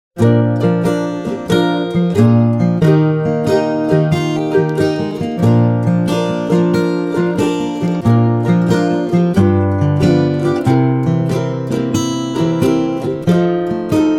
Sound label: hawaiian ukuleles